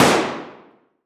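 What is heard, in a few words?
Weapon Gun Shot Gun 12 Gauge Benelli Automatic Single Shot Interior Shooting Range Short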